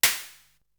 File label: Open hat; ZERO G - Datafile DISC 3